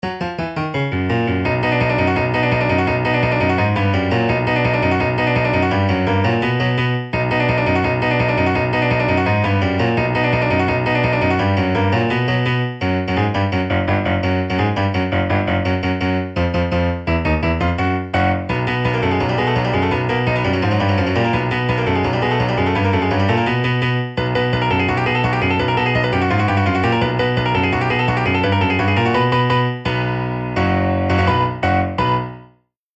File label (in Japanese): コミカル系, コメディ&アニメ&コミカル系ＢＧＭ